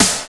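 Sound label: CRUNCH SNARE; Drums In Your FACE!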